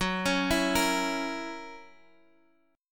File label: Gb 7th Gb down Guitar Half Step Down, chord